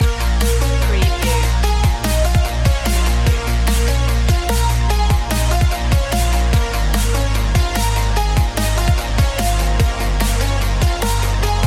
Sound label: biodigital future loop